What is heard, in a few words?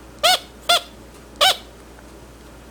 glider talk squeak